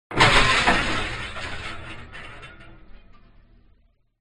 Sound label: CINEMATIC HIT Electric Drop; smartsound